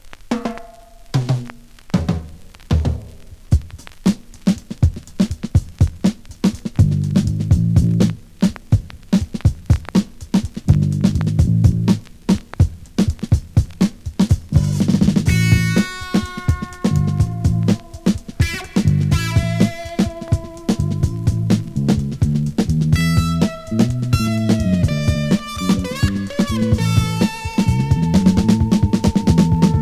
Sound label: Funk Key